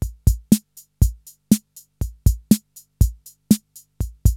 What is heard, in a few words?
CR-8000 LOOP, DRUM LOOPS